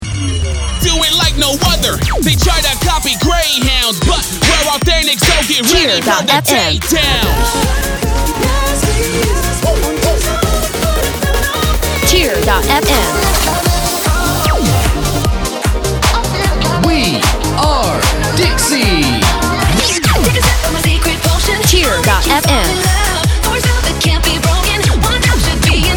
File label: Dance with loop; Dixie